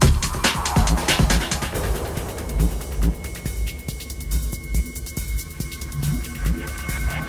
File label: music - cinematic; You Are Empty